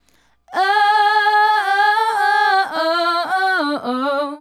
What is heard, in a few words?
Female Group, Hip-Hop and RnB Vocals